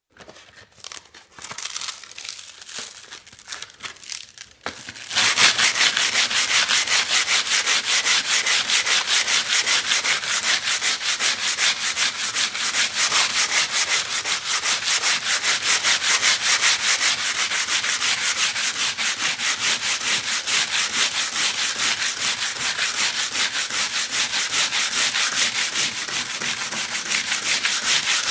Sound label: Sanding, paper folded then sheet of plywood; Sanders; BBC